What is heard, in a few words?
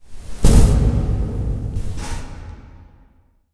mall random, ambient